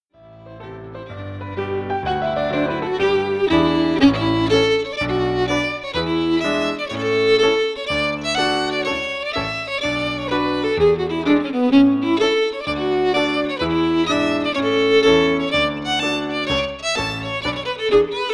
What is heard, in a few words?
Strathspeys